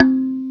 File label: KARIMBA; CMP - Analogon; RESO